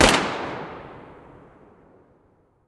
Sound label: groza muzzle mono distant, weapons